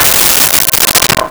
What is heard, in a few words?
Tube Bells C5; Nintendo; July 2020 Gigaleak